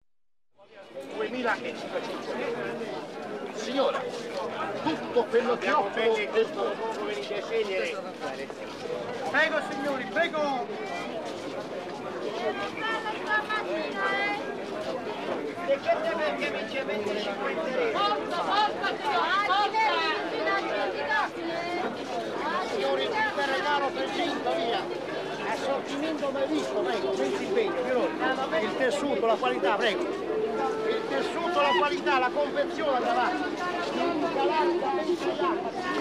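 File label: open market in Italy general atmosphere voices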